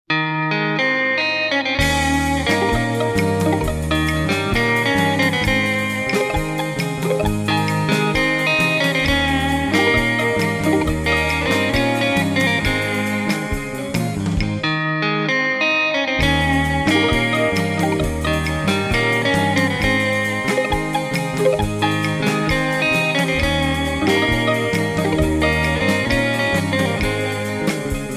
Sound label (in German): die gitarre; ehlektrogitara